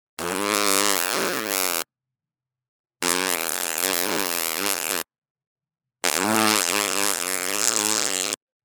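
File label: fart sound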